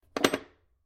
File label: close, chest; magicworld